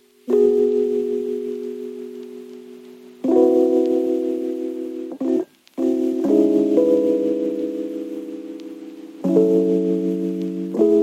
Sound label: Slowday